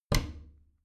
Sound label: bathroom cabinet warped close regular; objekte; tueren